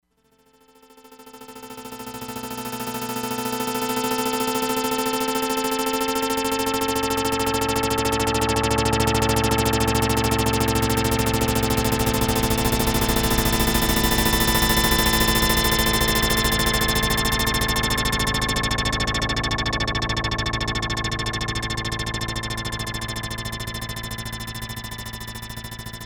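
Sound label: HELICOPTER AMBULANCE, Crumar Bit 99; Factory Programs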